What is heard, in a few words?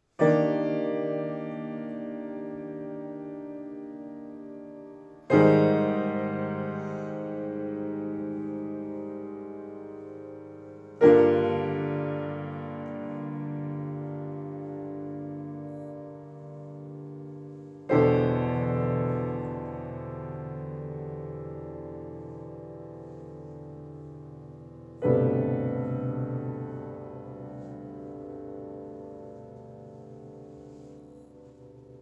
Piano